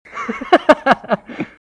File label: Laugh male; Humanos; SAPO Scratch